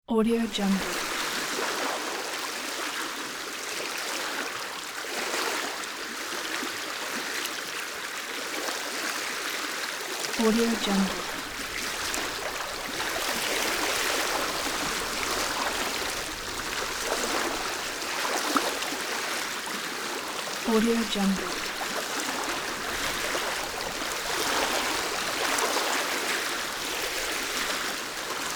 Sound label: lake waves